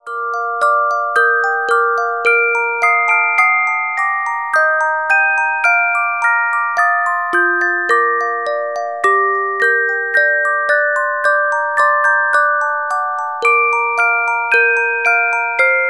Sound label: Carillon Music Box Melodies